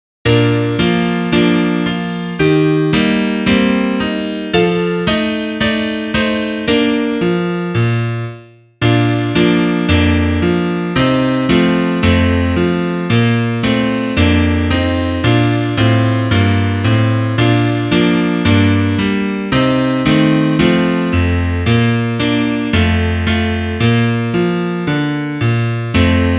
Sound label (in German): Klavier; haenschen klein